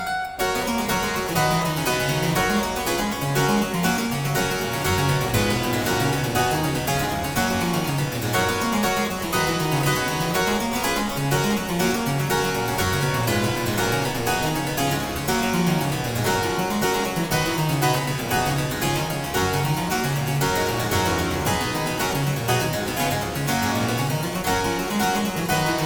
Aria Variations in G Major, Harpsichord

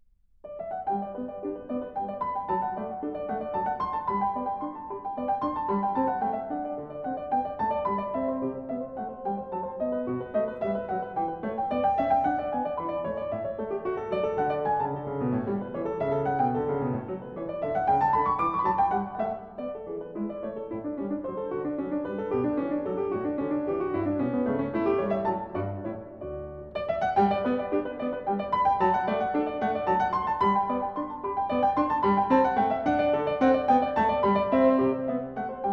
Partita in G-dur - Courante, Keyboard; Partita No.5; BWV 829

